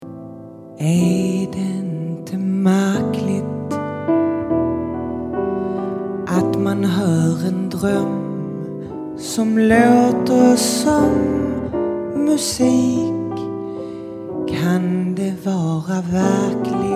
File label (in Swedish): Jazz